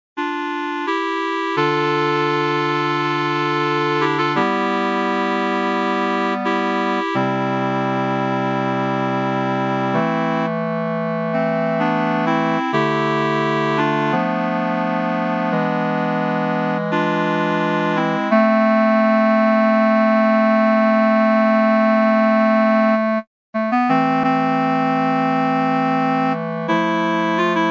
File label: midi SATB; Kaj o bergi fragment